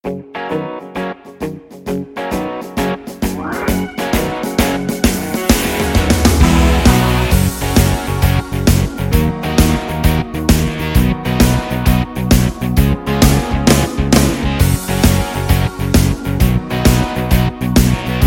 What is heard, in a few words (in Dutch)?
Instrumentaal